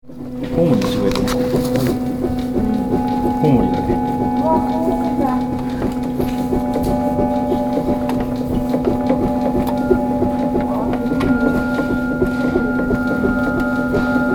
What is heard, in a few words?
organ ruins